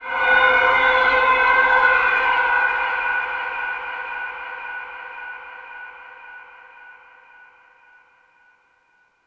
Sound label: ink phantom spawn; weapons; dota; grimstroke